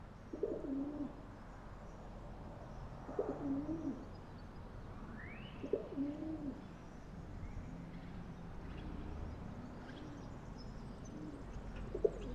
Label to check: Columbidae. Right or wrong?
Right